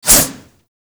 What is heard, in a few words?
Boomerang Whoosh; Warframe; Weapons